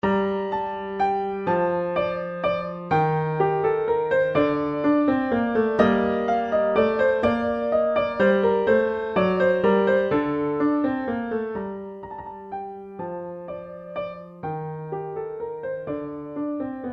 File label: Bach Menuet Sol Minor